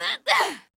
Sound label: Grunt; Lewd SFX